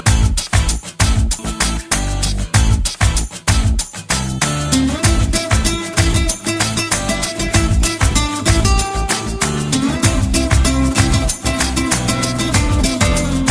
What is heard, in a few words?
Instrumental Tone, Telugu Ringtone